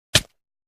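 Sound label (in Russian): удар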